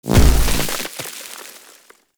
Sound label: Hoverboard Land Snow; Vehicles; Warframe; Venus Hoverboard